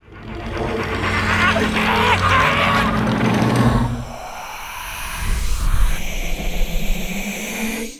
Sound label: Specter